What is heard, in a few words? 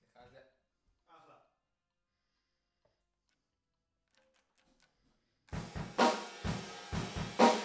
TB GTR